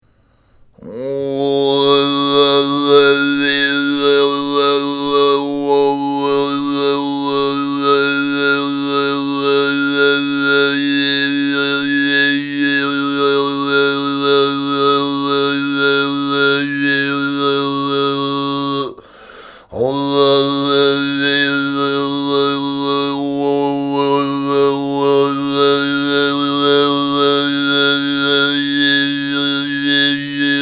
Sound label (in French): Chants Diphoniques; Xumun torlogton